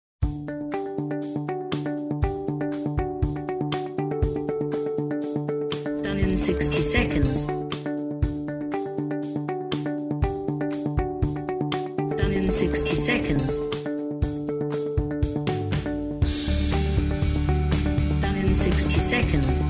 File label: Optimistic Keys